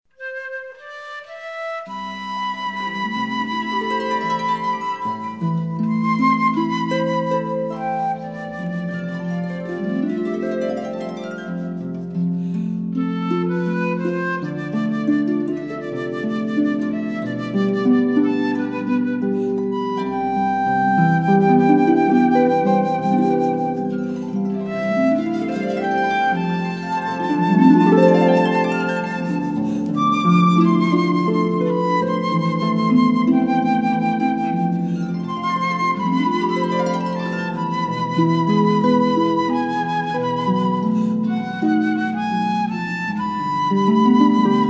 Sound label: Duo, harp